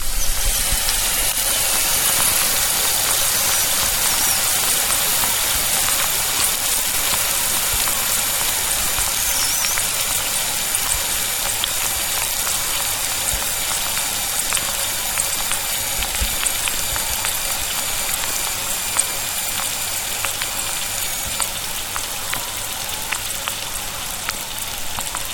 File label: Kitchen Frying pan